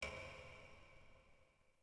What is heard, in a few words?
Space Tap; Ambient; Sid Meier's Civilization 4 Complete; Beyond the Sword